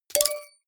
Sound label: sci-fi notification popup; maschinen; signale; soundpool